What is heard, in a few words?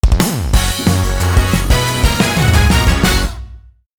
Result Win; the king of fighters for girls; android